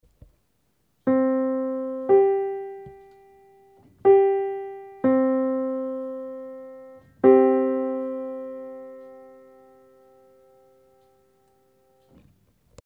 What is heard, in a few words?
696 Werckmeister 3, piano tuning